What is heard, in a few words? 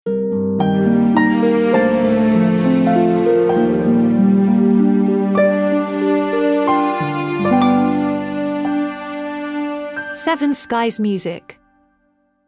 Heart Strings